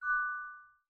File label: spell; angband